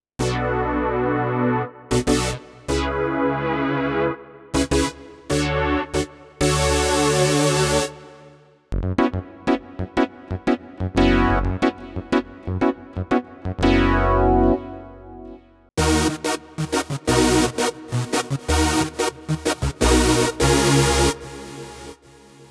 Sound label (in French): tyros3 sound synth poly, yamaha